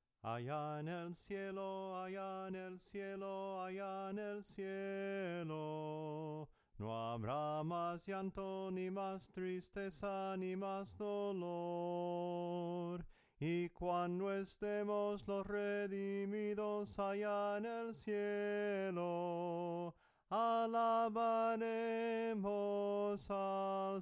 SOPRANO CANTADO, himnos en melodia soprano; cantos del camino

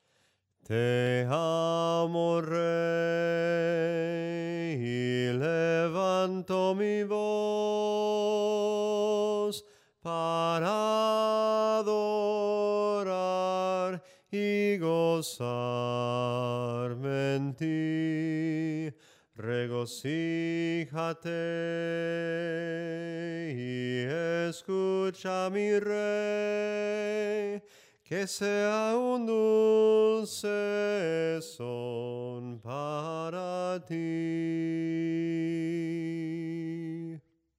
SOPRANO CANTADO, himnos

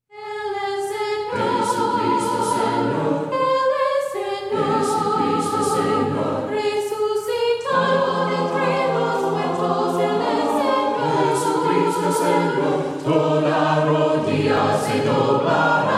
VOCES, himnos a capella; cantos del camino; EL ES SENOR

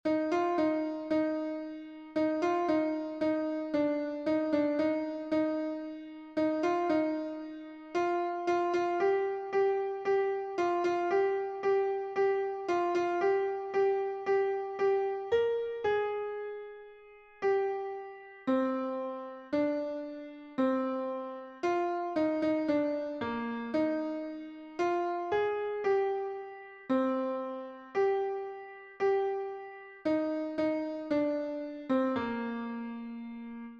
CONTRALTO, himnos en piano; INMENSA GRACIA; cantos del camino

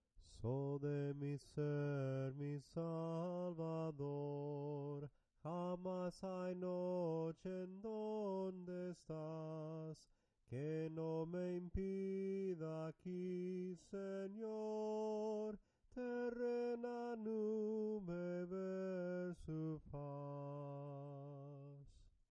SOPRANO CANTADO, himnos en melodia soprano

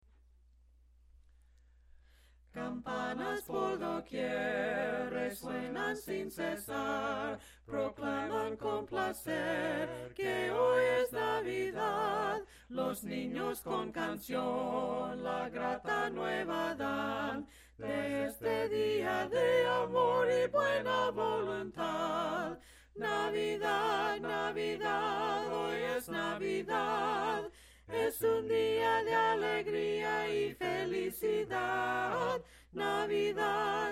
himnos a capella; cantos del camino

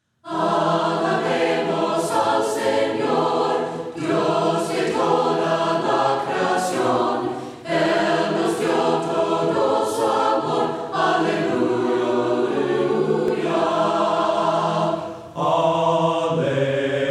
VOCES, himnos a capella; cantos del camino; ALABEMOS AL SENOR

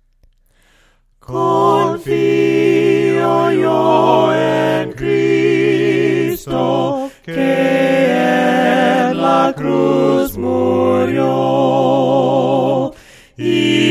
VOCES, himnos a capella; cantos del camino; CONFIO YO EN CRISTO

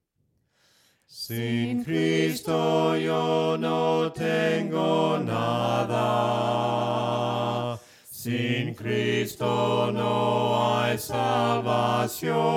VOCES, himnos a capella; cantos del camino

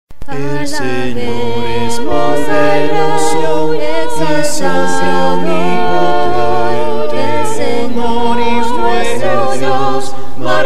himnos a capella; MARAVILLOSO ES; cantos del camino

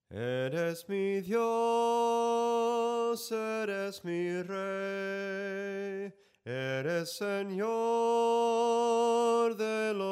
SOPRANO CANTADO

